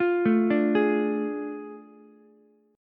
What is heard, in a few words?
A Major 7th Suspended 4th Sharp 5th, Ukulele, chord; AM7sus4#5; arpeggiated; JGuitar